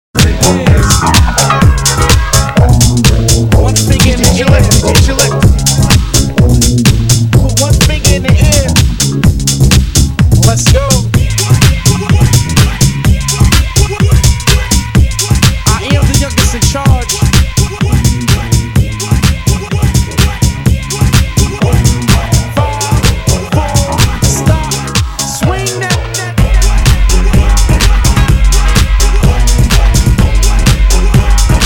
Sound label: Disco revenge Swing that